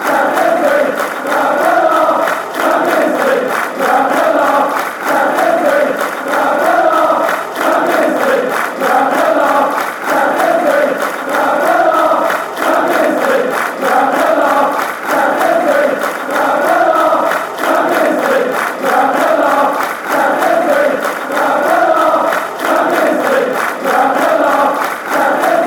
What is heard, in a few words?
champions league laugh